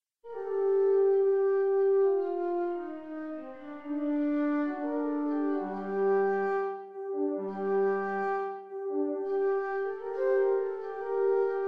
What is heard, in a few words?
tuba